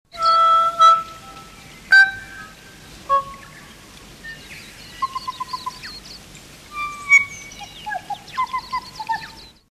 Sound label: kokako north island